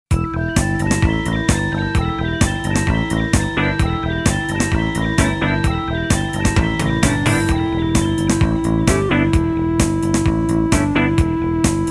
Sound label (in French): VERSION KARAOKE; Alex et Zoé 1